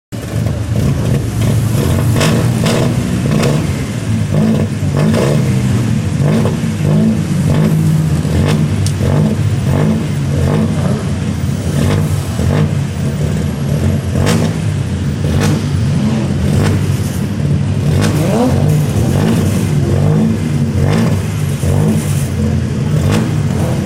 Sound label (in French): concert bugatti pétarades